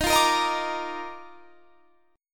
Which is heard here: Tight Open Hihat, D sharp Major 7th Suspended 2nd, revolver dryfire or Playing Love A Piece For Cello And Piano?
D sharp Major 7th Suspended 2nd